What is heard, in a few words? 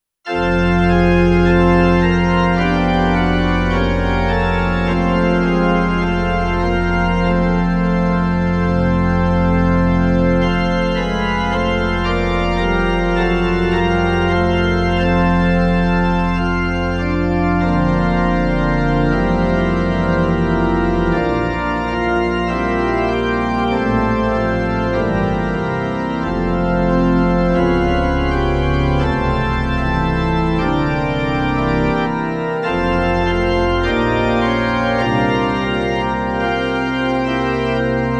Prelude for Organ